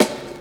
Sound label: Snare Hall; Lost Tapes From Mars